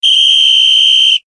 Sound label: whistle